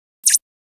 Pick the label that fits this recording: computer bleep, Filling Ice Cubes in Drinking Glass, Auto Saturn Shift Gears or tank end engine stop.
computer bleep